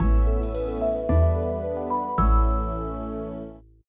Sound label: Jingle Ability Unlocked